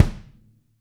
BD, DR BD; Best Service Gigapack 2

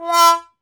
Trombone, Solo Brass

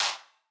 Wider Clap

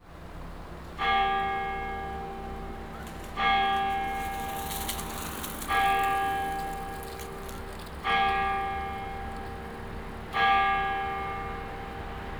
12 O CLOCK, Cathedral Organ; ILIO; Propeller Islands

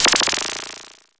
PRO1 NOISE; Crypton - DrumBase5000

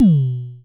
FM Kicks B2, Vermona Kick Lancet; LookIMadeAThing Kicks

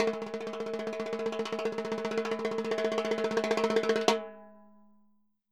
Roland MV8800 percussion

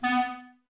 Clarinets, Wood Winds

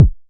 Click; Clicks

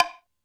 Hollow, Drum Machines Alesis D4

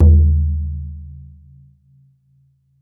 BODHRAN; E-MU Producer Series Vol. 7 – Old World Instruments

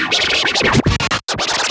Vocal Scratches 140BPM

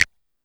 Clap, Yamaha DD-20

